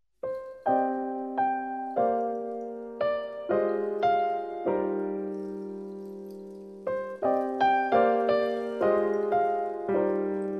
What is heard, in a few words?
Rain